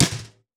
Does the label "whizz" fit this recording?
No